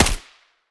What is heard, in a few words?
sa58 silencer mono distant